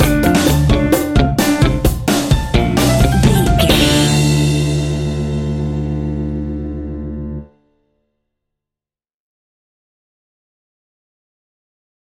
espanic pulse stinger, Spanish and Espanic style music with influences of Cuban and Latin rhythms; An exotic and exciting piece of Spanish and Espanic style music with influences of Cuban and Latin rhythms